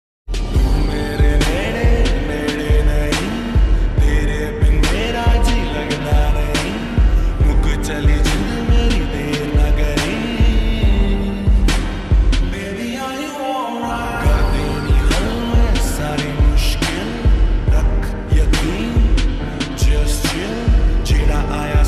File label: lofi remix ringtone